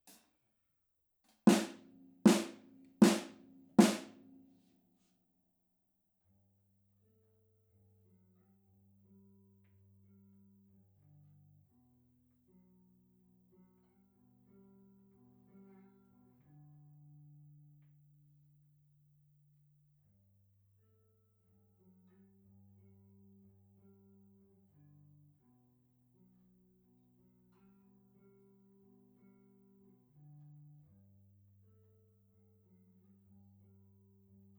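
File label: Rode overhead stereo, drums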